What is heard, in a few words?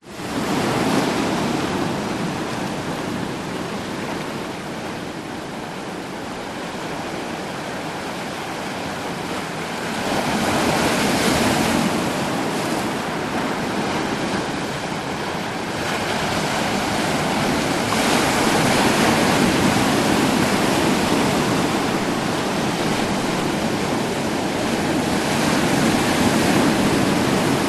nature ocean waves break on rocks noosa headland australia